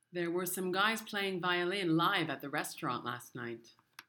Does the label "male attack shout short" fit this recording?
No